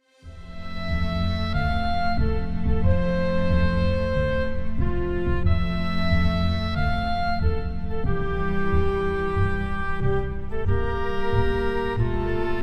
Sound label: Glass Jar Underscore